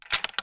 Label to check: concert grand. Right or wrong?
Wrong